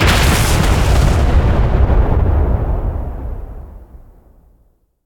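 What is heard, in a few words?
bomb explosion; War Thunder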